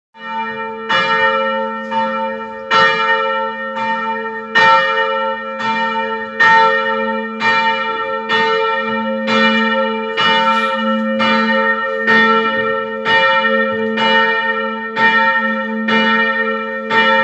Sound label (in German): Glocke